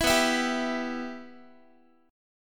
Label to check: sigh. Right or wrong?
Wrong